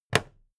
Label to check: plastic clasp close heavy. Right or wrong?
Right